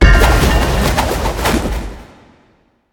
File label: activate; smite; kuzenbo